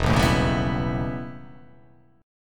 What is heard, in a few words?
E Minor Major 13th E; EmM13 strummed